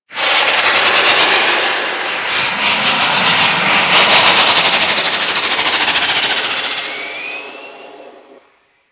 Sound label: Pet Rocket Projectile; Abilities